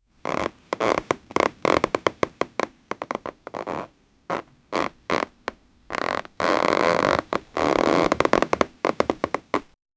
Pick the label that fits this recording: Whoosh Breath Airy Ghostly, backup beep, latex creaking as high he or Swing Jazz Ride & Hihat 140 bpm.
latex creaking as high he